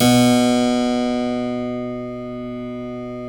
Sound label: B 2 CLAV; USB Soundscan vol.17